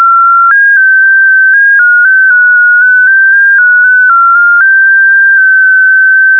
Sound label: FSQ 4.5